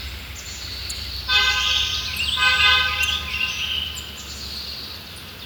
Car Horn Three Honks

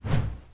Low Whoosh Bamboo

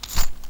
Coin bag

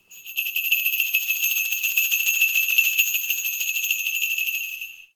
Jingle Bells Fast Ringing

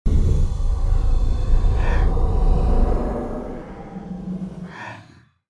Warlock Summon Dark Skill

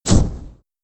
Whoosh Fire

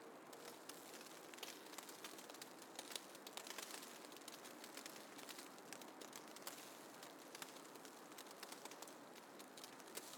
Snow On Umbrella

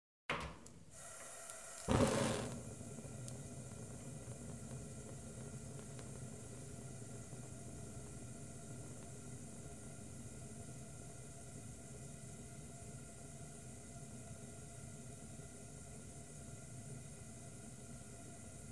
Lighting Gas Stove

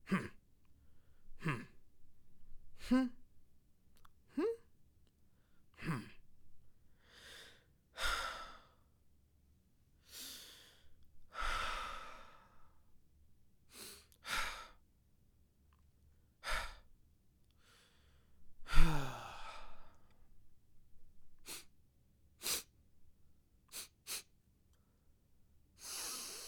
Miscellaneous Voice Sounds

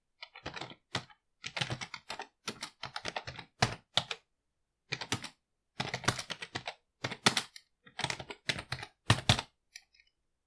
Typing Heavily On A Keyboard Punching Keys

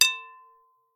Metal Hit Medium Glass Bowl

